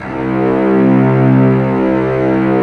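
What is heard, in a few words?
Cello Legato C1; Multisamples; Digital Sound Factory Virtuoso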